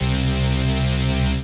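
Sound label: Rave Synth, Dance Mega Jungle Rave; 90 s Sample CDs; Best Service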